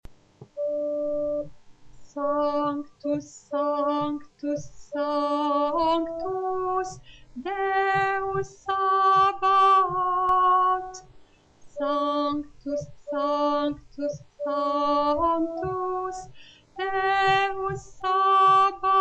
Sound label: Sanctus Alto